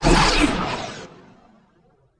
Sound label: Torpedo; ImpGal2; spacewar; weapons